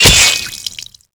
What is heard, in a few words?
wep hit; tm ventrilo; slender